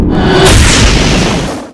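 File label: Dragon Attack Skill Attack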